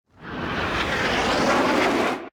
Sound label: damage hits explosions; World Of Warships